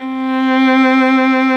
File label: VIOLIN C4, SOLO STRINGS; Greytsounds VOL-1